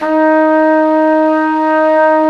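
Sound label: WND, Hybrid Winds, Flute & Flugel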